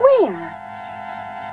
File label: Cheezy Sci Fi Loop; Sound FX